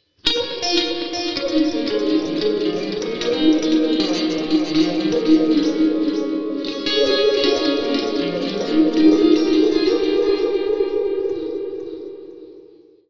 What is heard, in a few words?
Gtr A#, Slo Mo Funk, Lounge; Zero-G Kocktail Kollection; Construction Kitz (Lounge)